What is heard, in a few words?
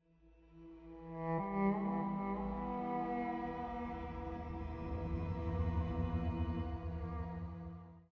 Sms Tone